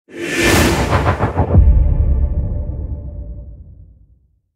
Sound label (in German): PORSCHE SOUND LOGO; AUSGEZEICHNET MIT DEM RED DOT AWARD 2019